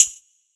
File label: Hat Closer; Closed Hats